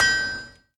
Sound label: draw Equip; b23 zuma